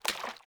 water bottle slosh fast; Rust

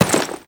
land dirt big, footsteps

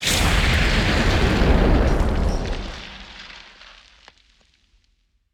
explosion material ground; War Thunder

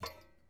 pickup chainlink; Rust

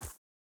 wolf footstep wood hard; Rust

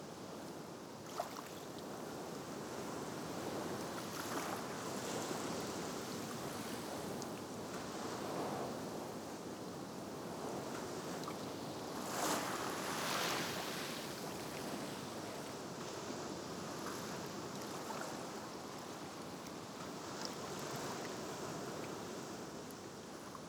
ambience shore breeze; Rust

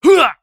hit; Escape from Tarkov

